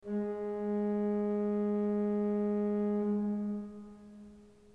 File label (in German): ton, orgel